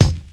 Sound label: snappy kick sample e key; kick drum samples